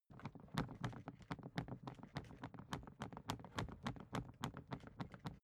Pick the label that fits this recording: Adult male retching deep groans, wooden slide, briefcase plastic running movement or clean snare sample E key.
briefcase plastic running movement